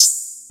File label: open hat; FL Studio; xander brown drumkit